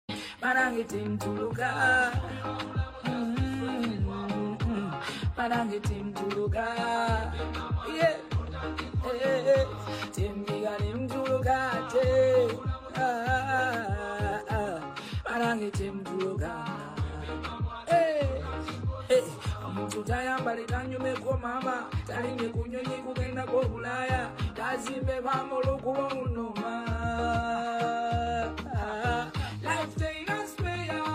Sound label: trending song